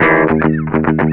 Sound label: guitar, Jazzmatazz Loops